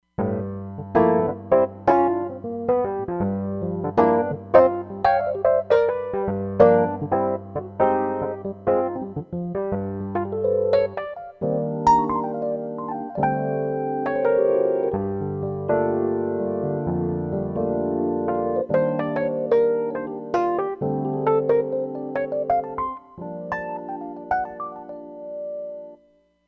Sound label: EP